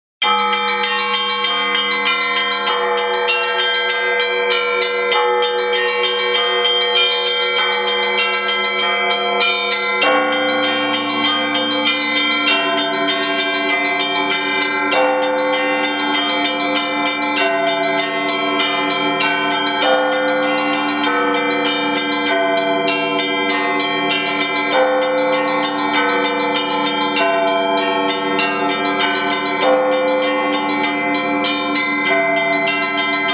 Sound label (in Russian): zvon